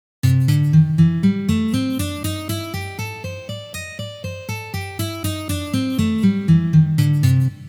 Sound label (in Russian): Blyuzovye gammy Lya minor